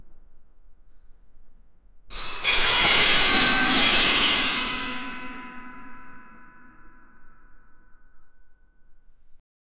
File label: metal parts of massive